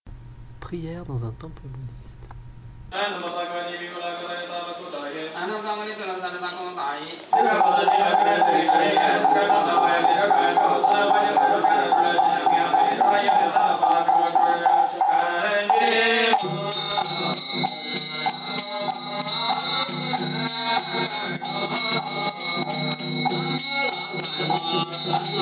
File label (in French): priere bouddhiste; chine